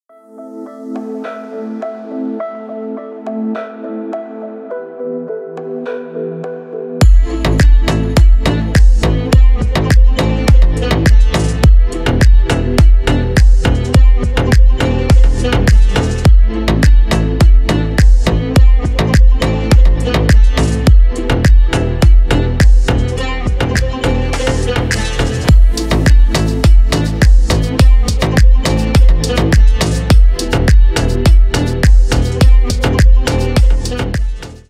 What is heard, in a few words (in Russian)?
melodichnaja otbivka na budilnik